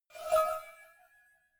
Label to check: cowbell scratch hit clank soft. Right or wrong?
Right